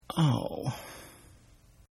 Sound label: Sigh; Exhaling; Sound Effects:Voice; Partners In Rhyme